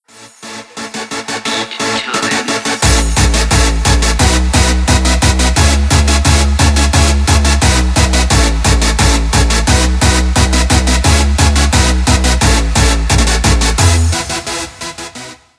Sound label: music